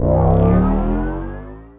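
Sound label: environmental shield phase on; Earth and Beyond; EA Games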